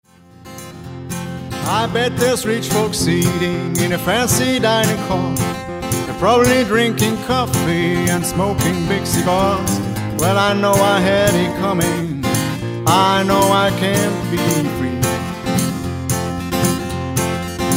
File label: country sample